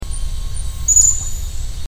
blue crowned hanging parrot

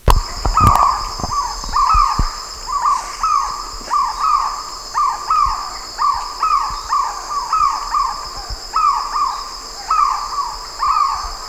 white rumped kingfisher

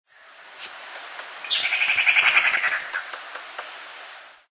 hispaniolan lizard cuckoo

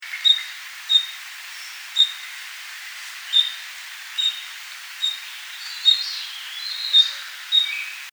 scarlet robin

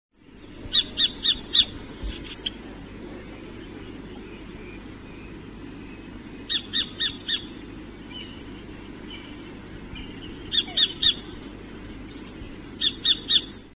sacred kingfisher

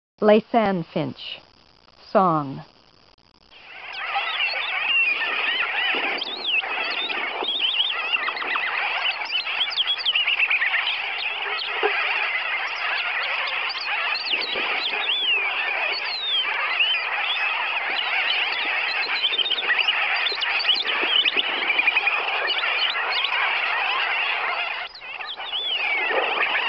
laysan finch

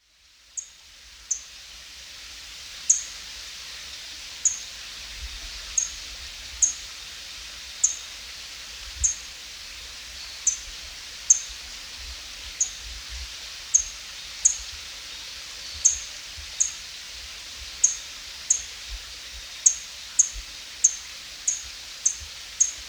white headed brush finch

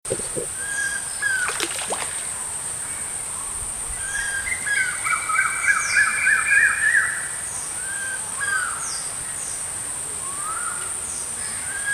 stork billed kingfisher

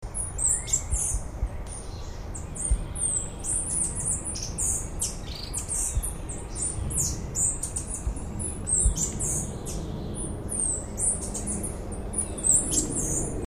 green striped brush finch

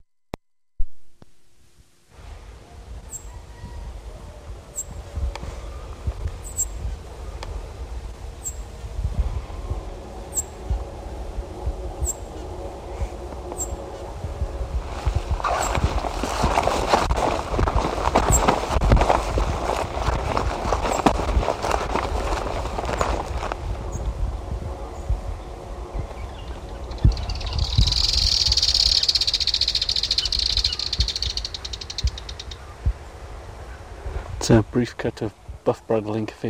buff bridled inca finch

